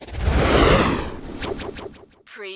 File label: Magical Flutter Whoosh Swirl Delay